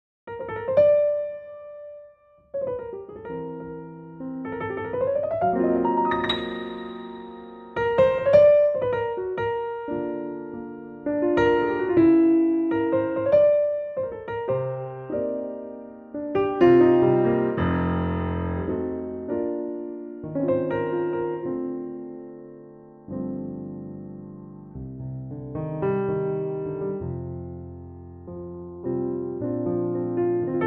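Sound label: Bb Piano, male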